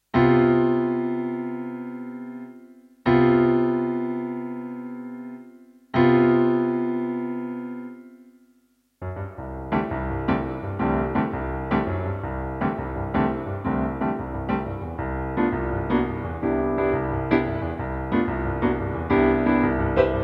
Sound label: Jazz Improvisation